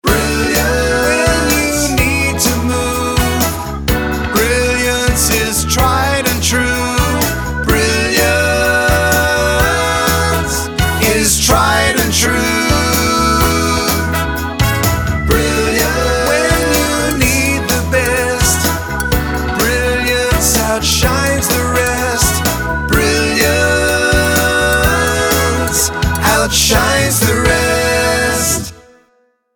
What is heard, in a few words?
SUNG; radio advertising